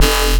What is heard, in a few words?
BASS SHOT